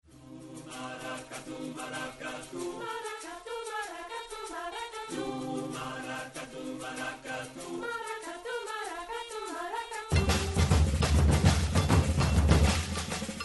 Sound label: maracatu; luanda